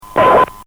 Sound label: Noise; The final "noise" possibly from AA 587 ... at 1416:10; (7 seconds before the crash); many have said they hear this as a fast "mayday"; flight587; FAA Tapes; JFK